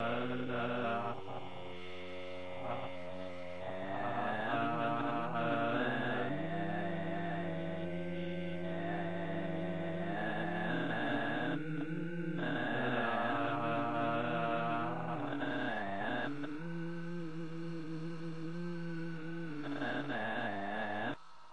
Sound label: granular weirdness; Robotic or Stuttery or Spooky